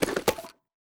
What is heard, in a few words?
armor pickup; Counter Strike Model Pack; CS:GO; survival